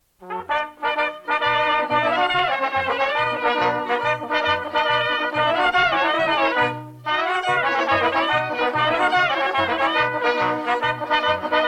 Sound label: village artesan band Piedmont Folk Dance; Tonco; Monferrina; PIEDMONT, EMELIA, LOMBARDY (vol1) DALLE RICERCHE DI ALAN LOMAX IN NORDITALIA